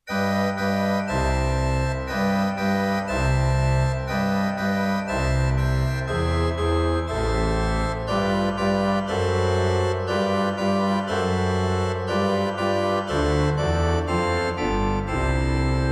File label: Augmented Pipe Organ